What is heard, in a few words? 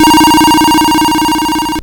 Arcade Riffs